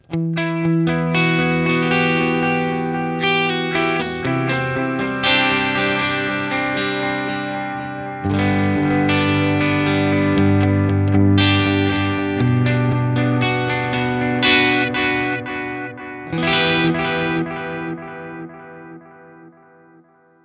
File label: pushed Analog Delay Hall Reverb; Boss Katana Artist